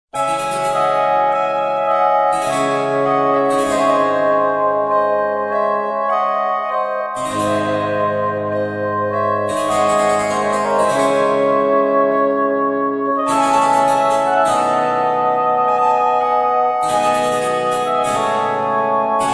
sonata f dur for flute oboe and basso continue largo; jean-baptist loeillet